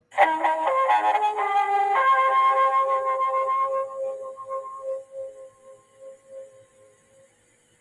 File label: dramatic electric guitar